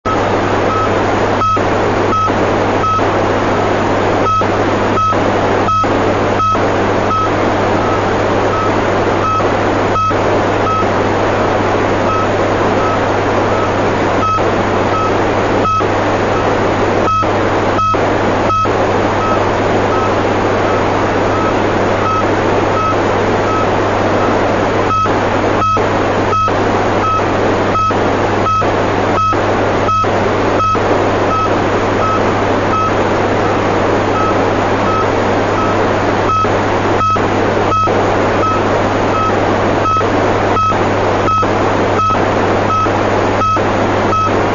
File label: sputnik, satelit